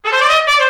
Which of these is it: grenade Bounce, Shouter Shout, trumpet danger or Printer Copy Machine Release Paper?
trumpet danger